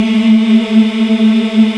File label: VOX African; Roland LCDP11 Africa VOL-1